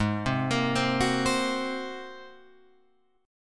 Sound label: G sharp 9th, Guitar, Open F; arpeggiated; 8,7,10,7,9,7; G#9